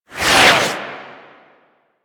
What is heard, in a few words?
Tank Missile Barrage Whizzby; Warframe; Lasrian; Abilities